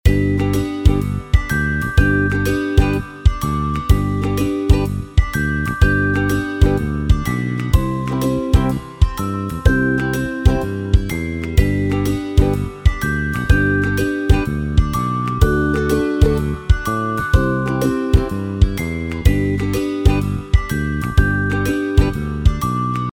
Young and Happy Lite Short Loop

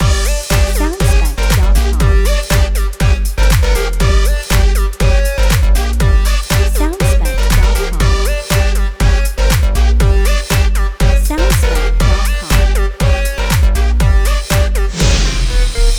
Dance in the House Intro theme

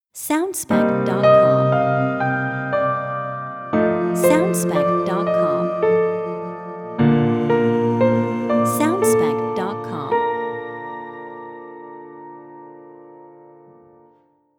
Dreamy Piano Logo

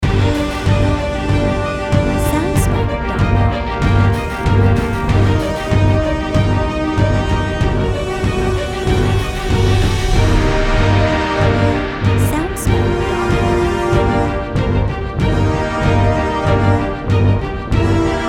Battle With Demons Main Loop

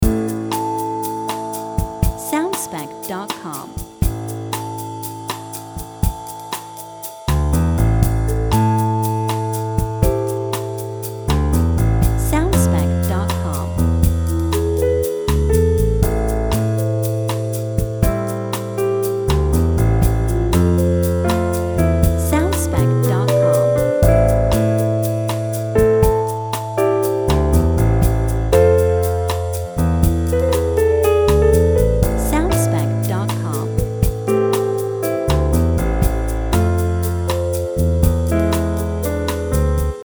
Mysterious Place

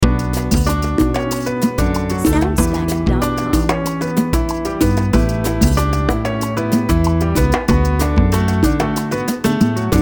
Salsa Dancing Song

